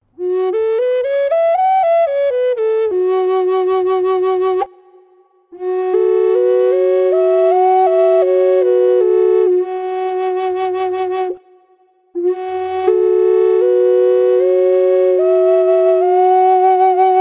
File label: F Sharp Dual Inlay, flutes drones